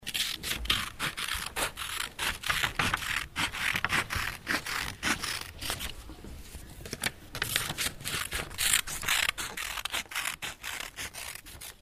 schere schneiden

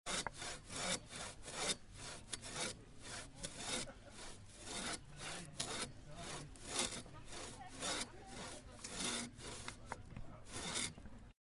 handsaege

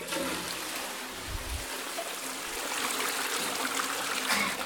Toilettenspülung

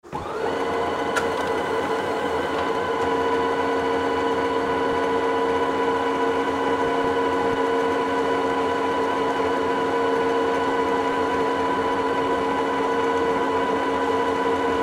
frankiermaschine

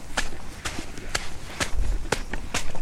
auf sand gehen

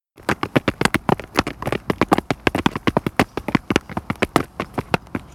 Trampeln

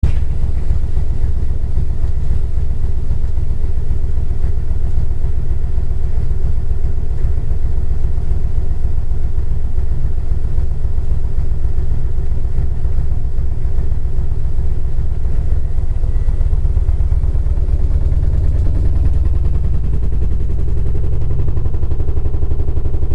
schleudergang waschmaschine

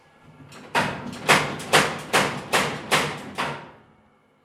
federhammer in der dorfschmiede

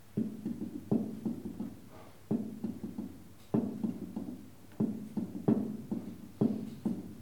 Stuhl kippeln

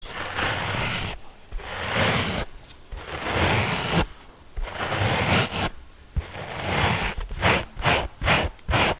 kratzen

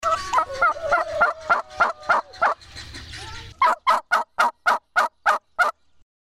Puten

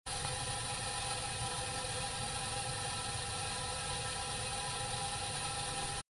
gasherd

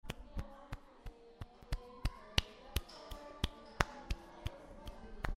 barfuss laufen

